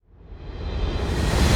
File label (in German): Spannung Start